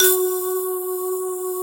SYN K-2000, Roland

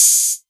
808 Hat O; Novation Drumstation

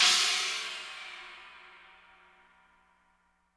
CHINA CYMBAL

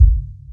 KICK, Hip Hop; HipHop Kick Snr Hat; PlatinumPhattCD1; E-MU Producer Series Vol. 8 – Platinum Phatt

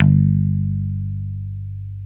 DAN MUTE G2, BASS

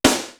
SNARE AROBIC; East West; Global Sounds

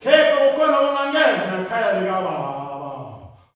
"BOUW-BOUW", MALE; Spectrasonics; Heart of Africa 2; S.MALEAMB

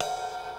505 RIDE; Hollywood Edge; The Big Bad Beatmachine

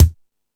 Dance 2000, Drum Machines E-MU Pro-Cussion

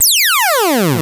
NL FX MIX; Masterbits; Vintage Voltage; SQ+FX

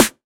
snare, Hardcore Breakbeat; Big Fish Audio; Hardcore Breakbeat Action; Riddim

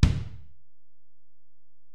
Ac BD, Alesis DM-PRO; 300 Drum Machines

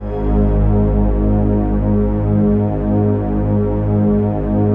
PAD, Dance Mega Synth

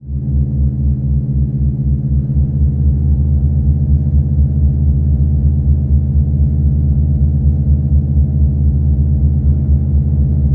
DRONE, PipeClusters; E-MU Producer Series Vol. 5 – 3-D Audio Collection; 3DSFX

